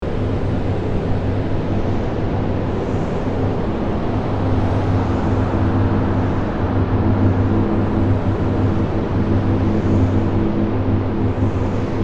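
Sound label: Ambience Fan Chamber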